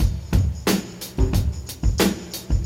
90 bpm drum groove g key, Free drum beat - kick tuned to the G note. Loudest frequency: 1077Hz; drum loop